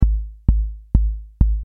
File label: 808 Kick; vcv